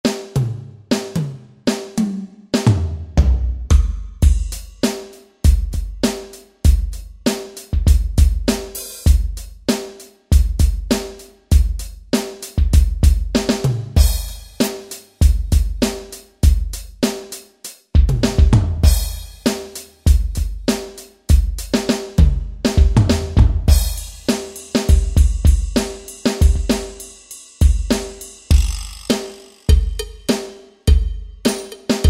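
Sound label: Drums Rock Kit, Yamaha EX5R; Factory presets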